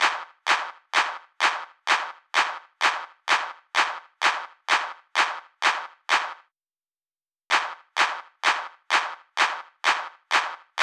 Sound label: Drum Loop Clap, 128BPM; VEC5; Drumloops; Multi Loops